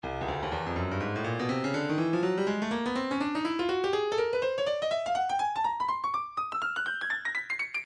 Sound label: stylus breakbeat midi 123 bpm jive house